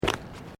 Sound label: Footsteps Boots Ceramic Paving Tiles Step; Footstep Foley; Footsteps (Sound Effects:Human)